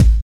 thumpy steel kick drum sound a key, Royality free bass drum one shot tuned to the A not; kick drum samples